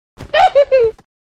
贱笑

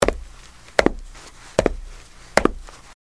缓慢走路; 走路跑步

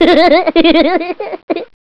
综艺笑; 笑声集合

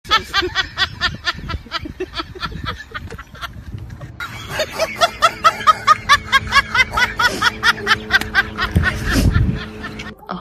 魔怔笑; 笑声集合

